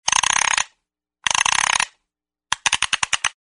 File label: comedy all wound up